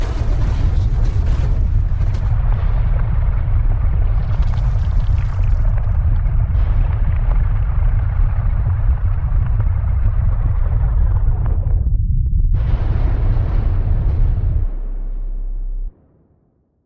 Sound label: earthquake with debris settling; SoundsCrate